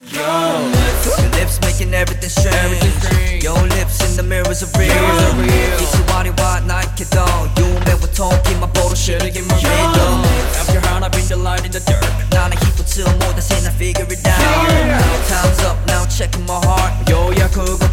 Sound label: RAP